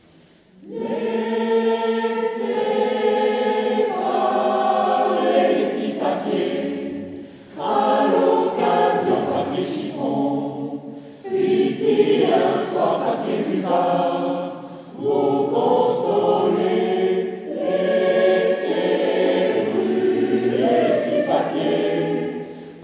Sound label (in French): Chorale